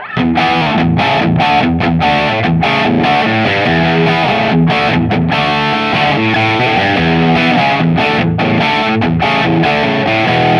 Crunch Strat Boost on; Gain 12; Treble 12; PPIMV 17; VVR 17

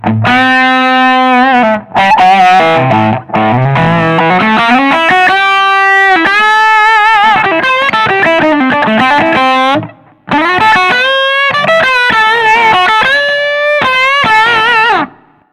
ODS OD; Vol 12; Bright up; Mid Boost off; Jazz; Treble 10; Level 14; Ratio 12; Presence 12

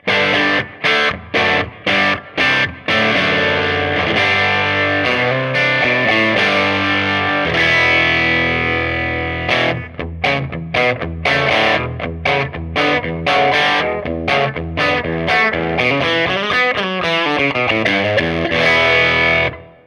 Rhythm crunch strat M pickup Closed back 4x12 cab; Gain 10; Treble 12; Bass 13

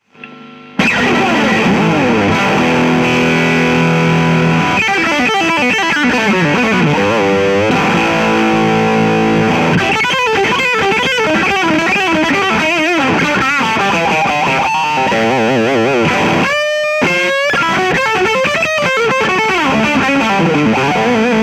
Crunch Mic; Bluetone

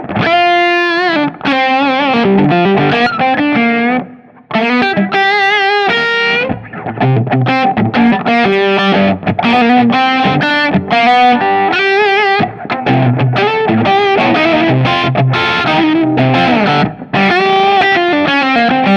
Lead Strat; Gain 9; Warm; Treble 12; PPIMV 17; VVR 17

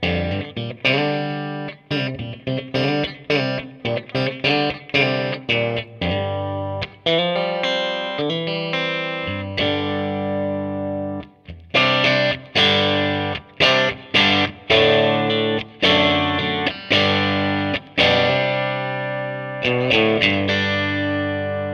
Rhythm crunch strat M pickup Closed back 4x12 cab; Gain 10; Treble 14; Bass 13

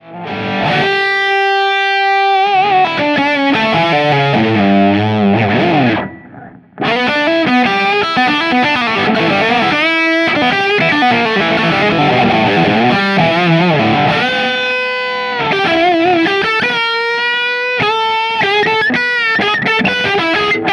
Lead Strat; Gain 12; Treble 12; PPIMV 17; VVR 17

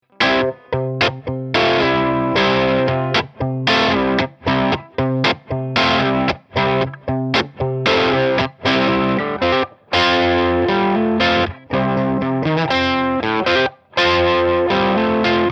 Brownie ES 335

